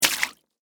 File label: Water Splash Throw, Drink; Splash Sound Effects; Splashes (Sound Effects:Objects); BlastWave